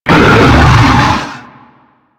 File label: Random Gutteral D, Infested Quadruped; Warframe